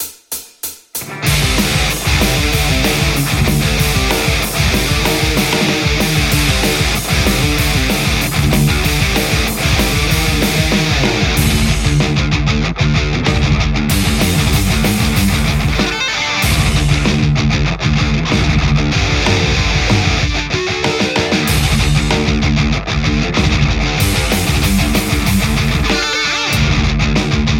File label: metal tele; tse x50